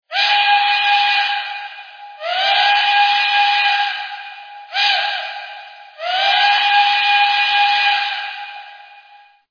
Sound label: Whistle; MTH; Docsider; One-Gauge